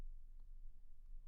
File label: Bass Room